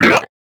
damage; Barotrauma; Husk

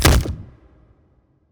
Hit Armor; Damage

